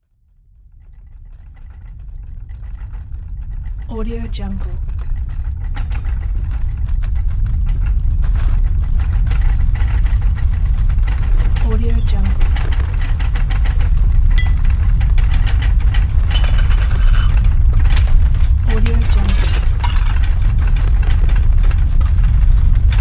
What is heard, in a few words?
earthquake shaking house